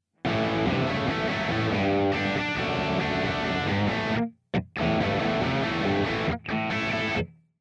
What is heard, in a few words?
dark crunch, guitars